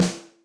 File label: Elem, Electric Snare, Yamaha DTXpress